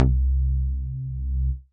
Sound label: Bass; Midnight Bass